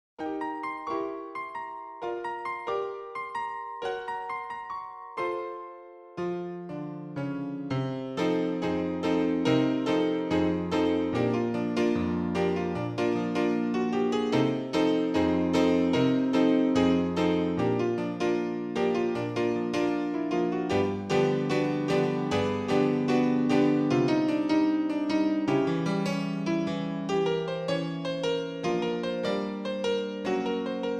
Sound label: Ragtime